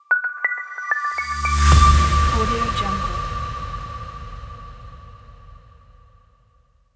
simple minimalistic logo

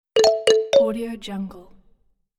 message

